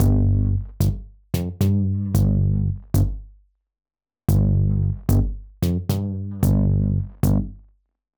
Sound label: G 112 Bass